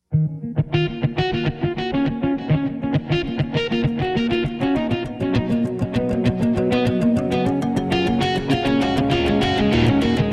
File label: Guitar Sound